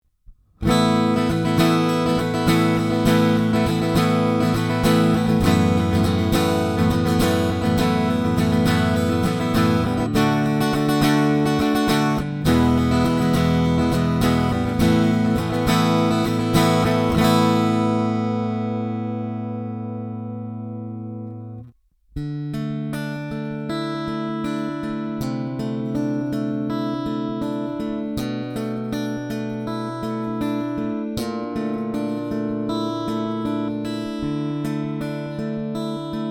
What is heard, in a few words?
electric; エレキ弦をはった状態の音